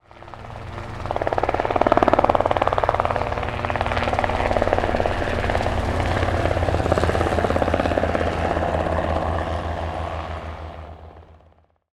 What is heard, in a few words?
helicopter fly over; transportation